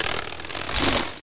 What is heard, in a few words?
activate, tripwire; hl2